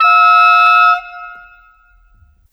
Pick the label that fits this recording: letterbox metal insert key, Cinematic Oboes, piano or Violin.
Cinematic Oboes